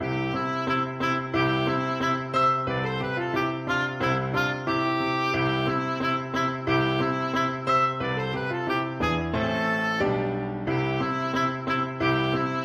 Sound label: beethoven theme, oboe